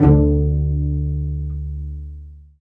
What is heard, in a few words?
Vcs Pz, String Sections; Roland LCDP13